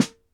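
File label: snare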